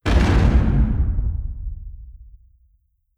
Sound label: distance adv kinectic fire; weapons; Stellaris